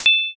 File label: block clear